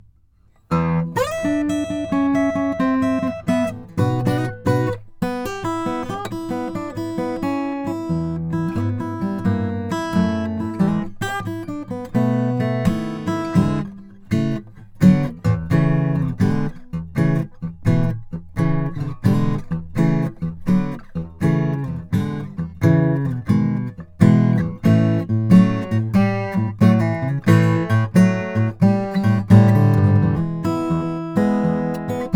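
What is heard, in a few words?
froggy bottom m deluxe german spruce top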